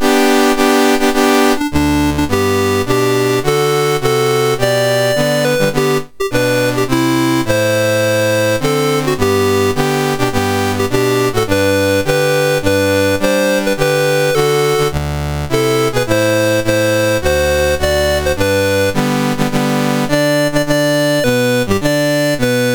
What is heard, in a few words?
France, National Anthems; Dave Marquis